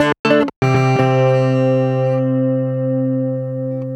Ac 12 Str Gtr 121bpm C end, Twelve String Guitar, African Guitars; Quazibeat - African Secret